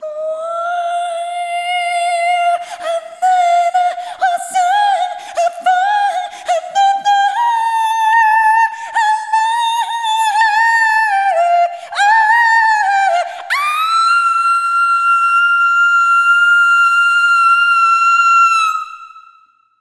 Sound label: Arrhythmic F